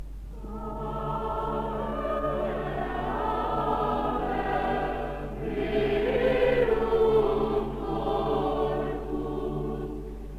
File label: ave